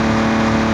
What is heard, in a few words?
small engine